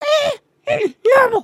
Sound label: excited, emotes